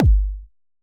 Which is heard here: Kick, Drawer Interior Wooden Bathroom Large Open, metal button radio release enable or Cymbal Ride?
Kick